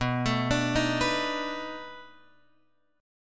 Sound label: B 7th Sharp 9th, down, Guitar, Open F; arpeggiated